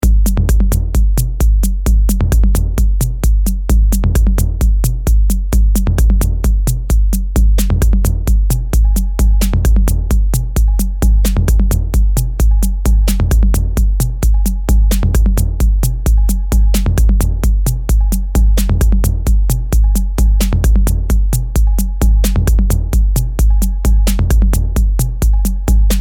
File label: ringtone